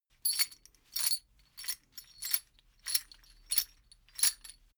metal chain movement sequence

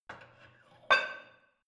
barbell light plate put on hit soft; sport freizeit; fitnessstudio

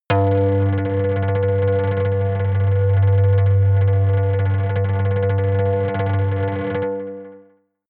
synth bass rattle tremble high, drones

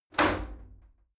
shower slide door open hit heavy

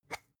spice jar scratch tonal open fast

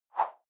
whoosh simple short fast

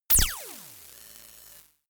laser welder short; krieg-waffen; soundpool

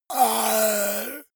monster gasp; kreaturen

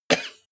cough female short; koerpergeraeusche

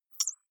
click synthetic light, signale

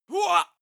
male attack shout

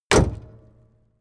car camaro close hood

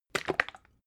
bottle syrup upend glug

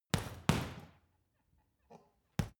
boxing punching bag side hook double hard; boxen

